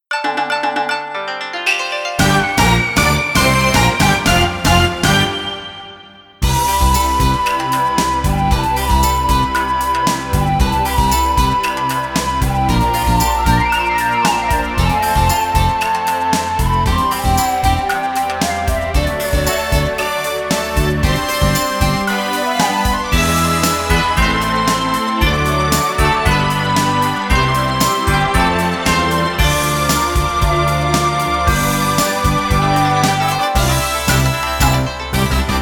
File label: Battle Victory Music; Kidou Shinsengumi Moeyo Ken